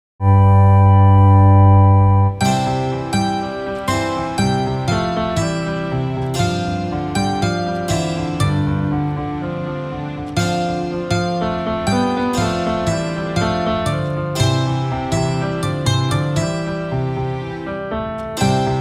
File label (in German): Hymne Playback